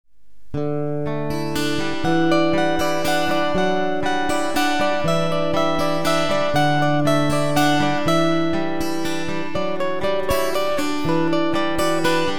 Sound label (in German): Filmmusik